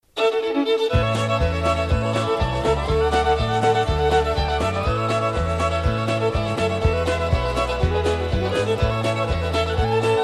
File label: Cajun Fiddle